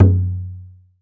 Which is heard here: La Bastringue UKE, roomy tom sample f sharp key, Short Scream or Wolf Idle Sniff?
roomy tom sample f sharp key